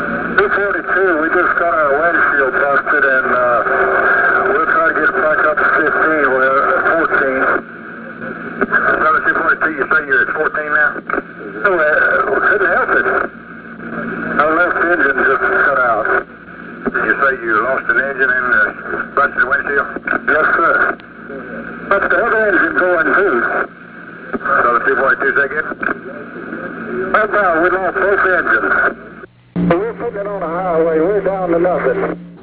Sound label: explosion